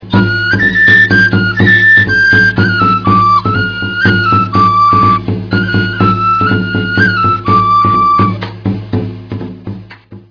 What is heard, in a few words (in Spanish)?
flauta